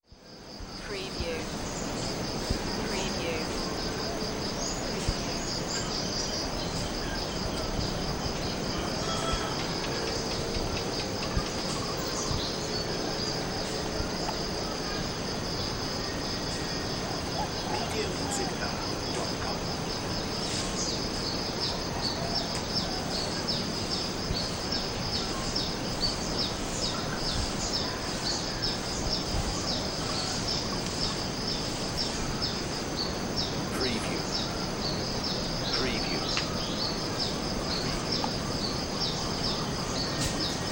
Outdoor Daytime Ambience

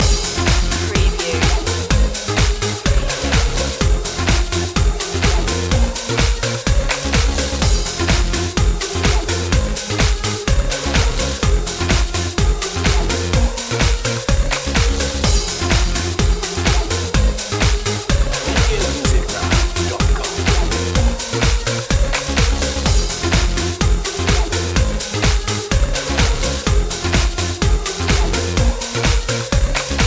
sexy step loop

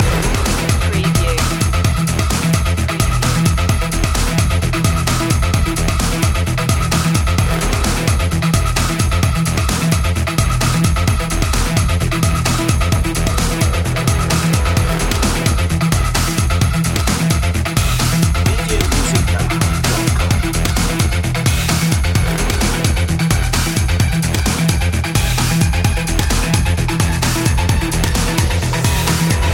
tailgate loop

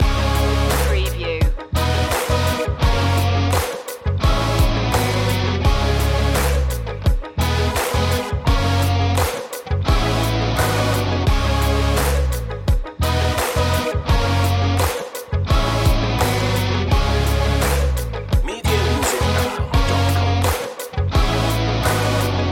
groove loop

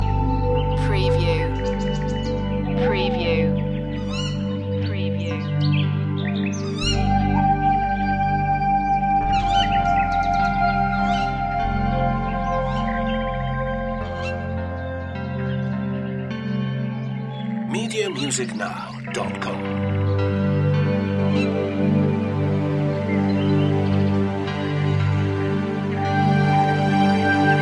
perfect bliss loop

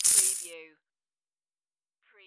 money

